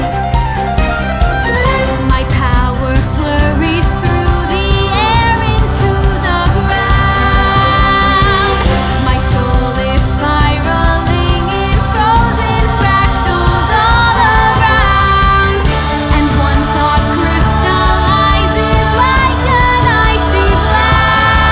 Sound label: Callback Vocals; frozen callback materials